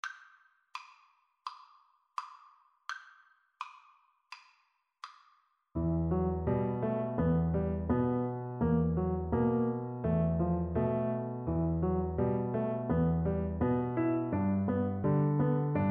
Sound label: grieg mountain king, oboe